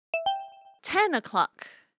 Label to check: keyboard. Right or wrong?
Wrong